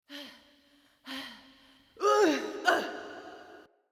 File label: Wake Up Vocalizations Female; Envoy; Cinematics; Bromius; Bromius Quest Wake Up Poppet